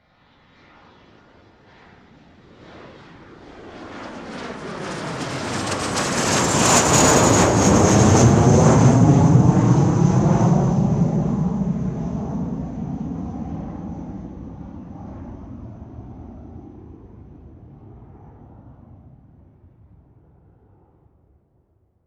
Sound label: Jet Pass By, Aircraft, Private; Transportation; Airplanes; BlastWave